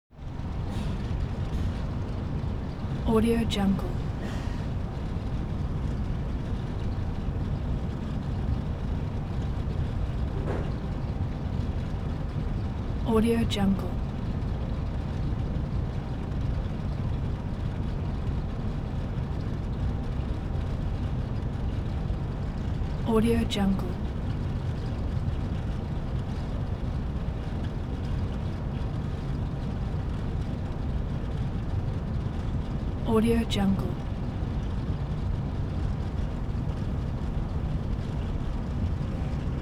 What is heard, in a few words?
object fan office ventilator; smartsoundfx